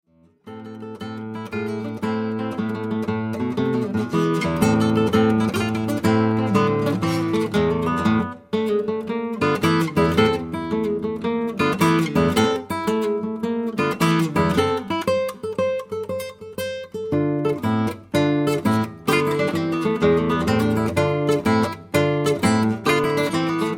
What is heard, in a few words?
Tarantella